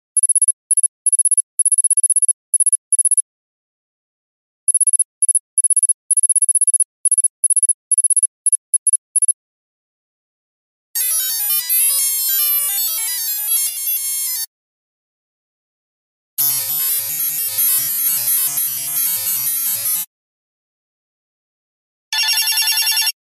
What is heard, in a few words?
computer interface; radio signals